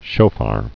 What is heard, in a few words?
shofar